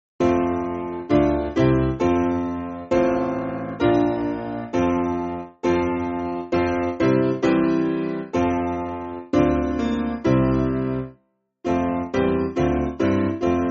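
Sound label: Piano, Music Backing Files for Churches; Upon the Cross; O Welt Ich Muss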